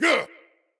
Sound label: attack grunt; smite; god odin